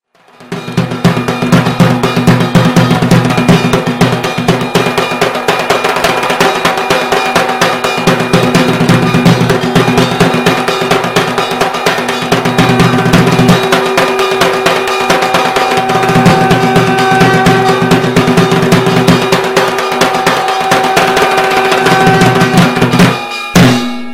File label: dhak ringtone